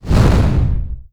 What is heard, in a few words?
Summon Fireball; Nicktoons Nitro; arcade